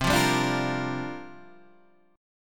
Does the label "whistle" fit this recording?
No